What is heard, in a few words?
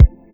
kick, ragga dub reggae